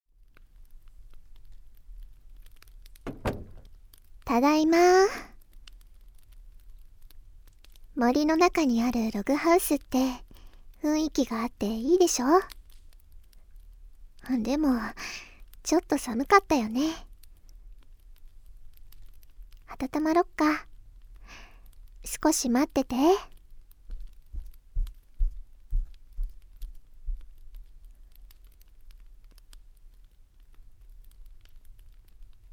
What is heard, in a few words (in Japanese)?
耳マッサージ・頭なでなで, ASMR; 暖炉の傍で温かな時間